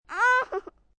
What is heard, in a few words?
Moan Uhh, Moan, Groan, 1 child, short, in pain; Groaning; VoiceBox